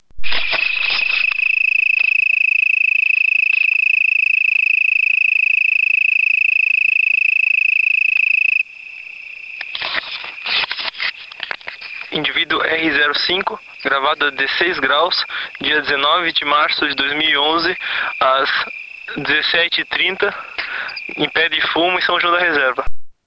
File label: Oecanthus pictus - 16degree Celsius